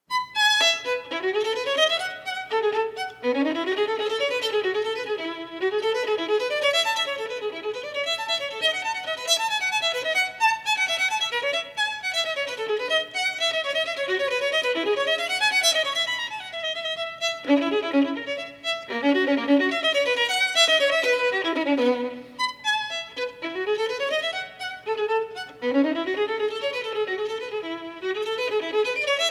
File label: Gigue; Partita No3 in E major